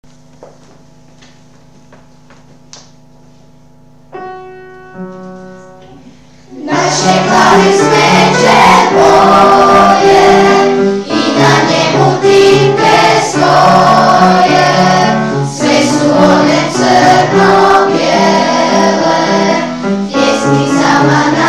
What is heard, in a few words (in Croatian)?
klavir